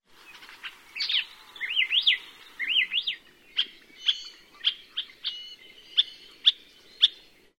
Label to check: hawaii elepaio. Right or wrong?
Right